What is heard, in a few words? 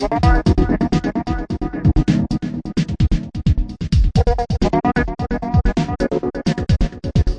130 gorgeous echo cut shadow beat; VOCAL OR VOXY LOOPS